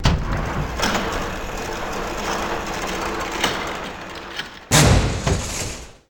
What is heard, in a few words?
Outer Door Down